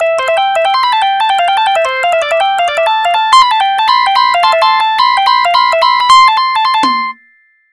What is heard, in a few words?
Piano; Mario Paint Composer; Family Guy